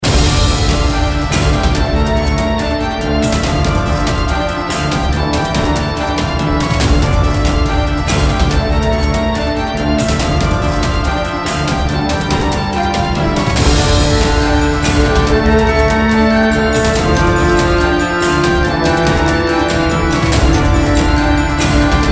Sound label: Raid Boss; Mist Train Girls